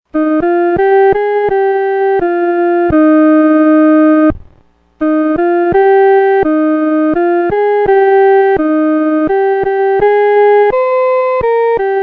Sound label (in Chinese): 独奏 第一声